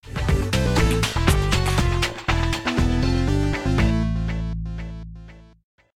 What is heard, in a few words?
sms ringtone